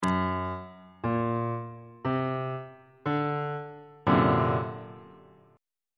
d augmented 7th 1st inversion on bass clef

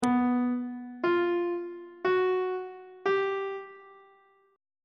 c flat 5th intervals; on treble clef

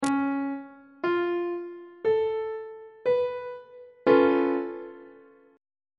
c sharp augmented 7th chord on treble clef

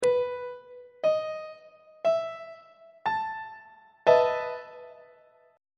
f flat major 7th suspended 4th 2nd inversion; on treble clef

